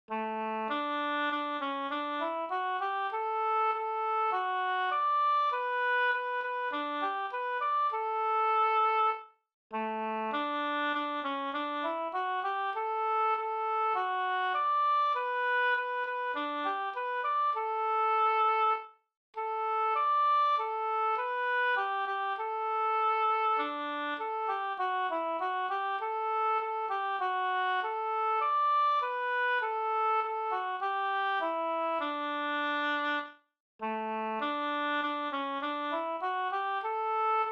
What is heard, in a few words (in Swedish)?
vismelodi